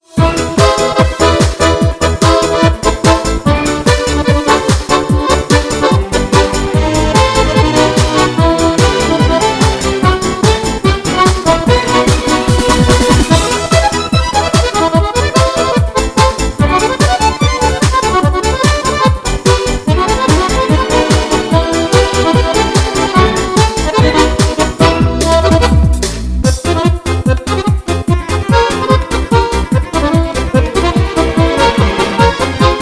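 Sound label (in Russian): мелодия аккордеон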